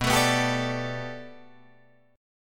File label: B Minor 7th Sharp 5th B Guitar Drop D, chord